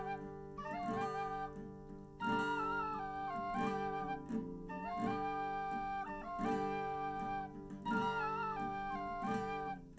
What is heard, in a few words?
nodrums liverec 250ms 5cm mix; SiSEC11; underdetermined